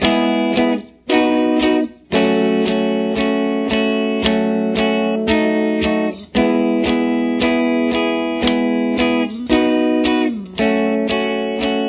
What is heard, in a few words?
verse guitar